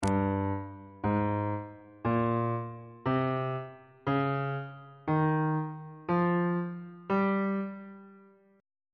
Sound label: g locrian mode